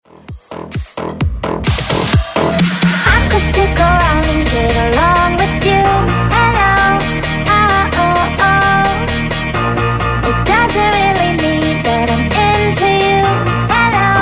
Bounce

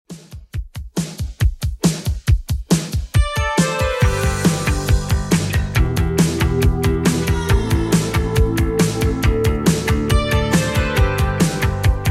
80s Redrum 138bpm

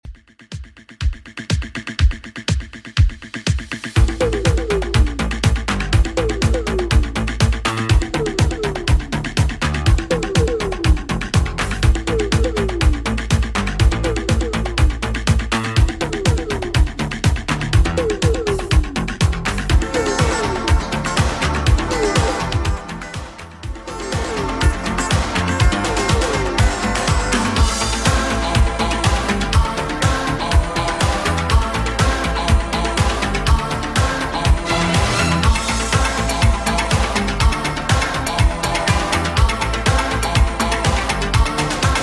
80s Redrum 122 bpm

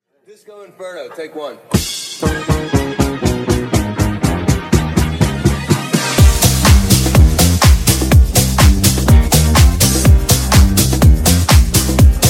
Funk Clean 124 Bpm

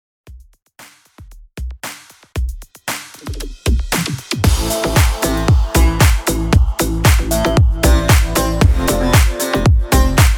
REDRUM 115 BPM

